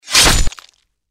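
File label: deathclaw claw attack; npc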